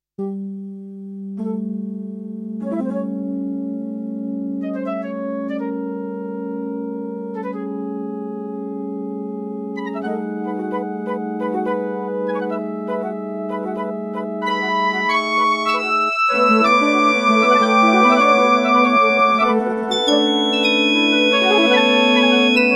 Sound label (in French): LES FLUTES